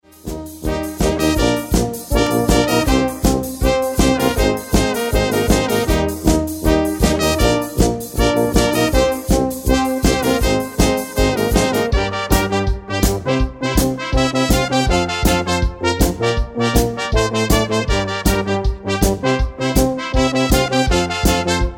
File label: Future Trance